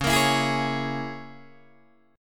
Db Minor 6th Db down Guitar Open D

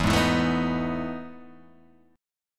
E Major 11th E, Guitar, Half Step Down

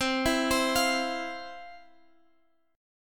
C Major Add 11th, guitar chord

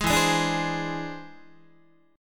E Augmented 7th E down Guitar

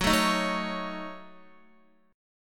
Gb Minor 6th, Guitar; strummed; JGuitar

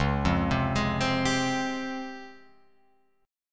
C sharp Major Sharp 11th, Guitar Open F; C#M#11; arpeggiated; tuning=Open F; guitar chord

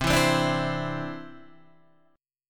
C Major Add 9th, Guitar DADGAD

